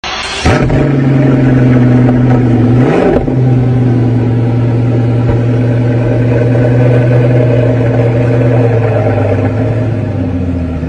pagani huayra; اگزوز

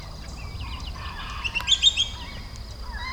Giant kingbird

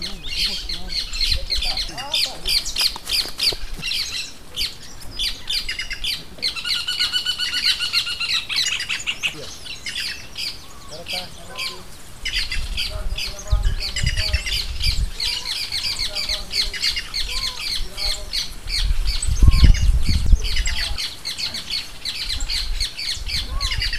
rufous hornero

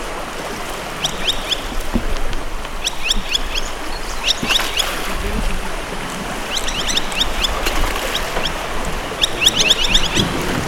Madagascar pratincole; پرنده گلاریول ماداگاسکار

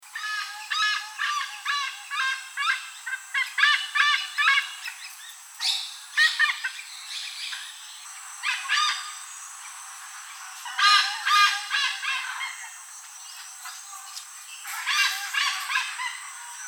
malabar pied hornbill

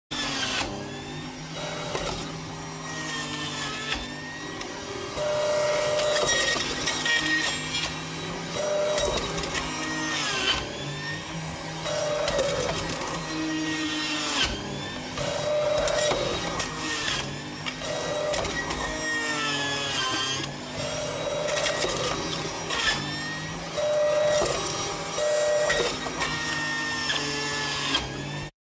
Juicing; آبمیوه گیری

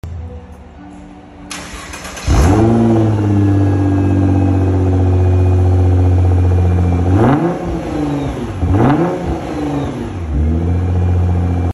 nissan gt r; اگزوز

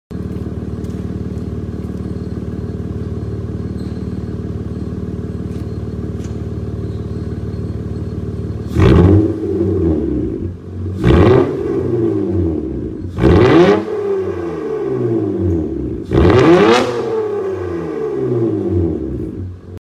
acura, ماشین آکورا

